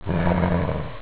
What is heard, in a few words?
"raaahh"; ses efekt